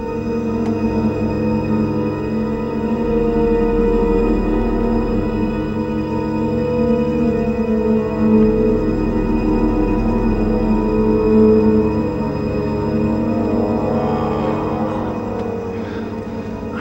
pad darkmood; Sonic Foundry (Sony Creative Software); Ugly Remnants VOL-2

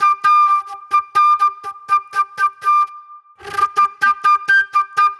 Soul-Jazz Flute, Call And Response

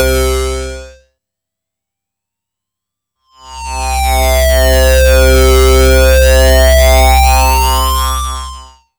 C3, Synth Line; 90 s Sample CDs; NiceBeats; SynthLine VOL-21

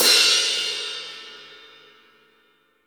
CRASH, OLD ZIL CRS

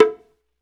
PRC, Bongos

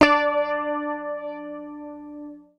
PICK, Bass Library; Sampleheads; Will Lee Bass Library

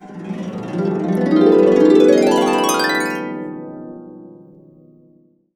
B CASCADE UP, Harps; Complete Harps; USB Soundscan vol.62; AKAI

